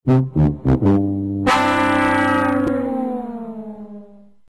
fail

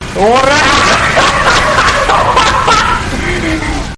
hahaha

